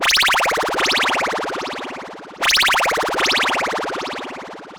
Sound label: fx; Google; NewWave Labs